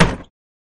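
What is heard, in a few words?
Cellar Door Quick Close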